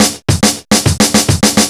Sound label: Loops Fills; Vengeance Samples